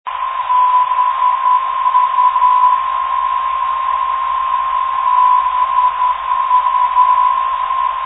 515khz

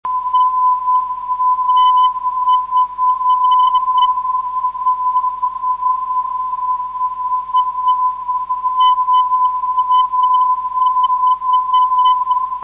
sav 351khz, ndb

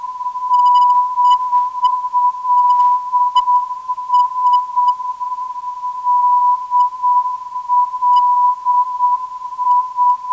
413.5kHz; DLS

